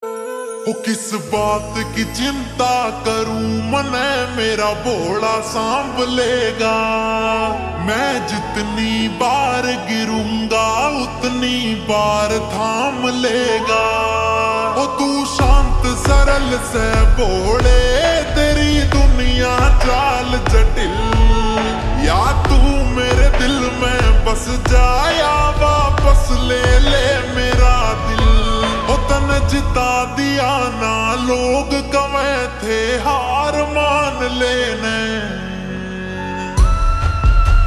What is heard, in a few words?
Ringtone slowed and reverb